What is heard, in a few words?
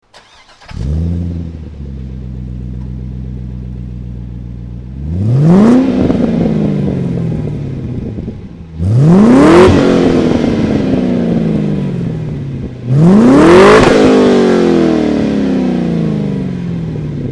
magna launch